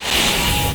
e skill charge

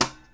guitar tap

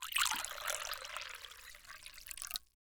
pour water

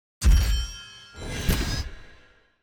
ui misty finish

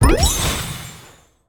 imp explode

